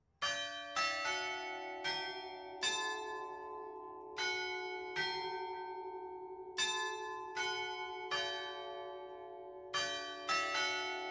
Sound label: carillon; Carillon automatique et Cloche des Heures; stpierre